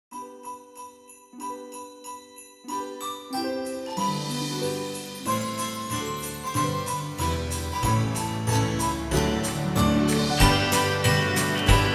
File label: Jingle Bells Country Swing